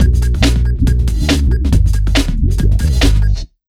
Big Beat Drumloops, 140

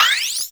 Riser, Synth FX; musicradar; cartoon caper

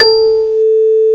MUSICBOX; BELLS; M8; CMI Factory

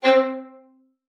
VIOLIN SPICC, C4; Xsample VOL-5

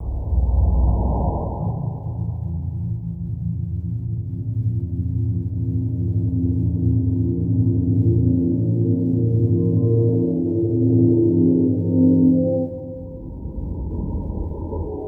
SUB WAVE, Special FX Twisted; USB Soundscan vol.14; AKAI

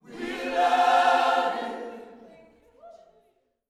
WE LUV HIM, Vocal Planet Gospel; Spectrasonics; GOSP PHR

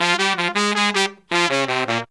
160 bpm, horns

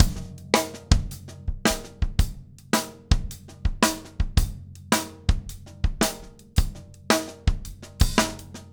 TRIP, 165 TRIPLETS; HI BPM; Steve Gadd Drumscores

